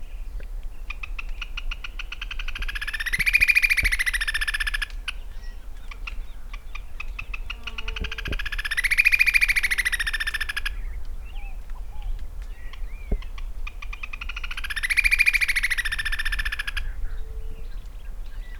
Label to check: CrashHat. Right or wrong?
Wrong